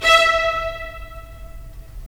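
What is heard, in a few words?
strings violins, left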